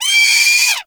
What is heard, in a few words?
Sea Bird Vocal Death; Characters; Warframe; Ostron; Hunting Critters